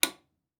Switch Light Switch, Household

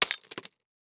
Impact Glass Debris Hit By Spatula; Impacts

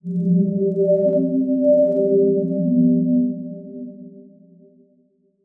Transition Musical Tone Drone; Production Element; Title Transition; AdobeCC

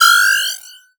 Multimedia Internet CD-Rom Flash Wipe Electronic

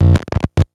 Electronic Electric Arch Single

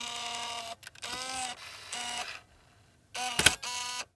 Technology Electronic Video Camera Eject Tape

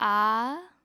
Human Female Confused Ahh

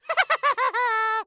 Cartoon Human Male Evil Witch Laugh Taunt

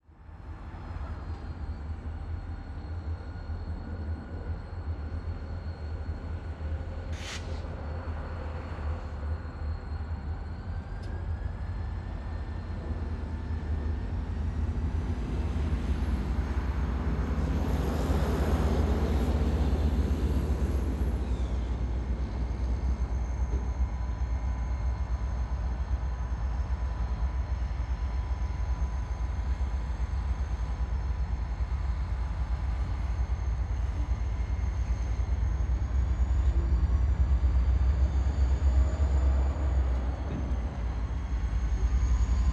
Ambience Train Near Road; AdobeCC

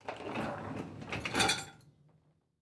Dishwasher Shelf Movements Dishes Rattle; household

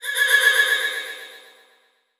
Multimedia Internet CD-Rom Flash Hi-Tech

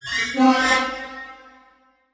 Transition Musical Tone Warble; Production Element

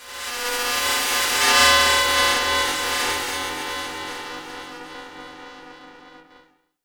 Transition Feedback Sweep; Adobe; Production Element

